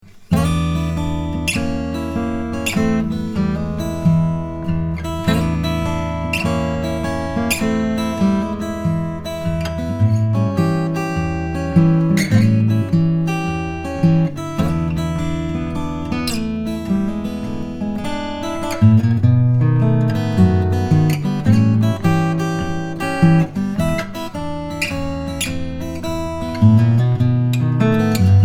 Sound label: blues